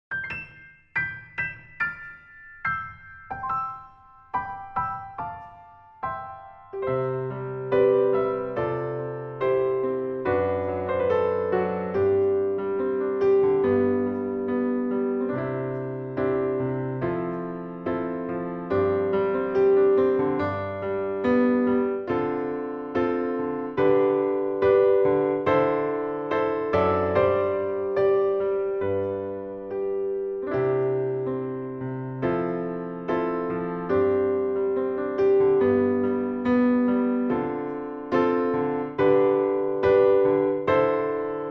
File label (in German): Julia sitzt am Klavier